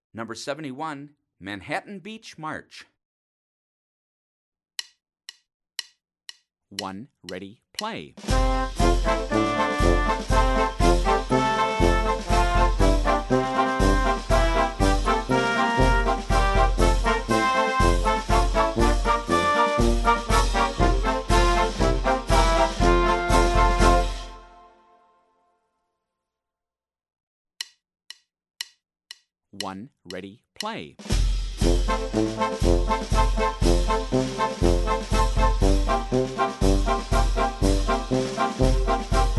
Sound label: march